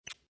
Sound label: Highlighter Pen Open, Remove cap from pen, plastic pen; Pens (Sound Effects:Offices)